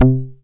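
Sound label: pluck C, ARP 2600; Polyend; Simulacrum Sounds; 1 Shots; Synths; Keys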